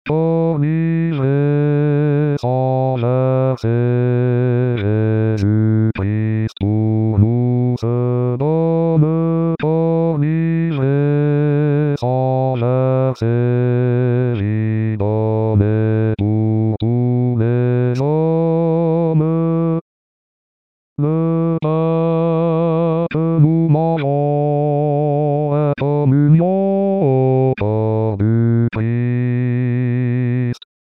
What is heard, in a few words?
Corps Livre Basse, Chorale